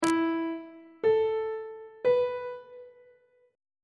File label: f flat suspended 4th triad chord intervals on treble clef